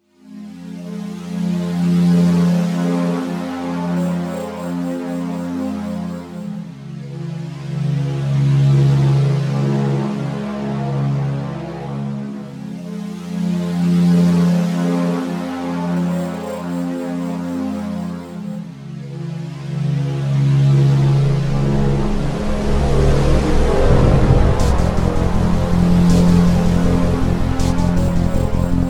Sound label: vortex soundwaves